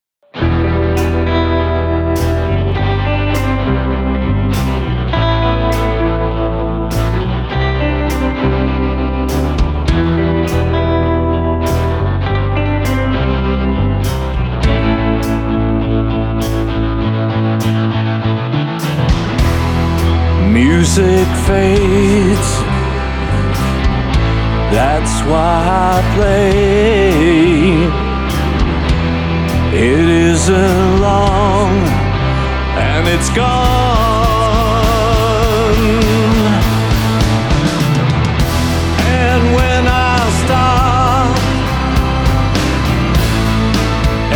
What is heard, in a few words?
Music Fades